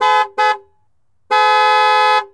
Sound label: Jeep Wrangler Horn; Trucks